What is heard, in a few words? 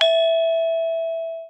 gong E5, keromong